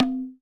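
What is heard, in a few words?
TABLA right; WorldSounds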